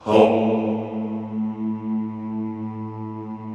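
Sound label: Vohmm Houuu, Chorus; Sound & Vision; Gigapack I CD 2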